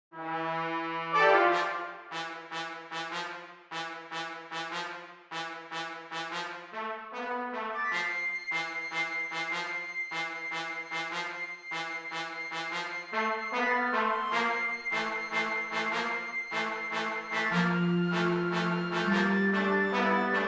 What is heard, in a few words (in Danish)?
combat musik intro og outro og loop